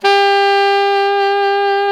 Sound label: SAX ALTO MP, Tube